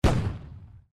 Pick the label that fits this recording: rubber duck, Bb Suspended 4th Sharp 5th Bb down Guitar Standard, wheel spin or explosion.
explosion